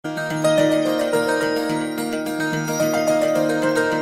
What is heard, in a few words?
Melody Msg